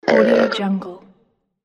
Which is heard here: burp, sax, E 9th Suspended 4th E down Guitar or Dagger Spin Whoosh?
burp